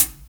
HAT, Loops

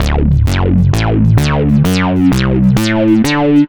Weird walkin bass; Sonic Foundry (Sony Creative Software); Ugly Remnants VOL-2

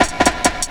Old School Cut, Jungle, Drum Loops 168.5; Zero-G Jungle Warfare 1, 2 & 3

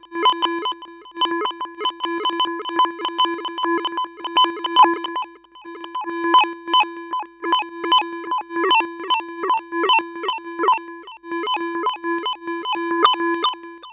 Modular, sci-fi

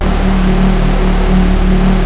Prophet 5 D2; Hollywood Edge - Giorgio Moroder Rare Synthesizer Collection

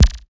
DRM OLD, dance synth

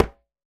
Conga Mute Low; Roland BK-5; Percussion

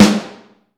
Vintage Snare Drum, 6.5X14

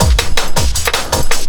LOOP L, Drum & Bass 160 BPM

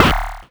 Cwejman S1 - Delay

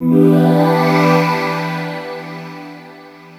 chord, 145 BPM, drum & bass; Ueberschall; Drum & Bass Flash Trackz

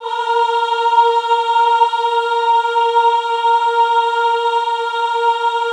AHH A3, choir; Best Service; Extended Classical Choir; AHH MIX

